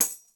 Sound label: tamb hit